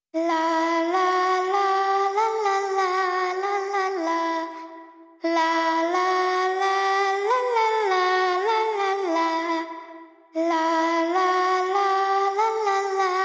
Speeded Main Voice Only

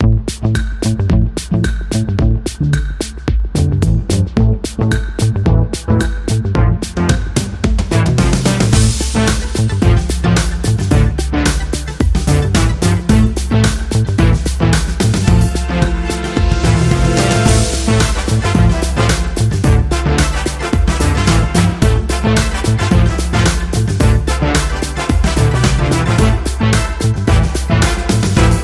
Indie Vibes

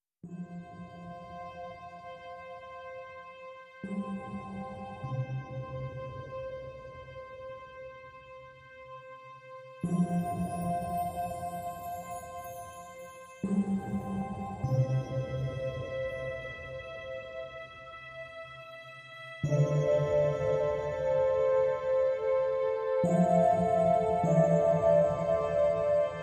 Bed Mix

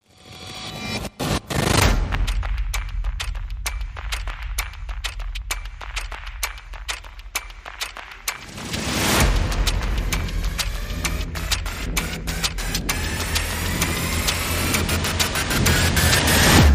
Percussion and Effects

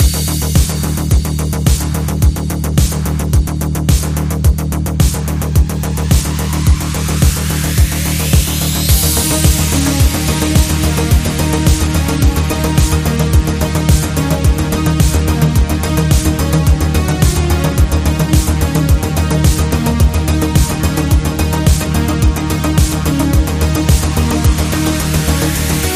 Creeping Tension

